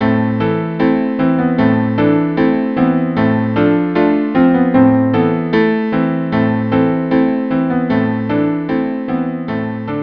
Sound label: cello and piano; Anah Halach